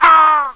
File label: Arg; Anno